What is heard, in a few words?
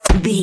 bullet impact dirt